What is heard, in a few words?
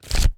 Pop-Up Open; AudioWizards